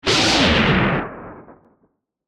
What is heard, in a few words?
Distortion; Sound Design; BlastWave